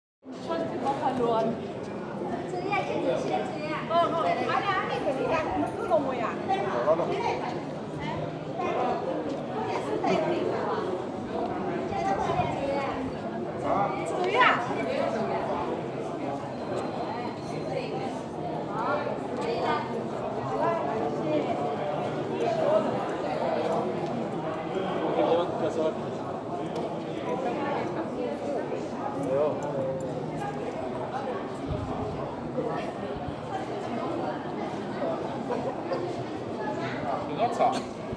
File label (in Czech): Ruch ulice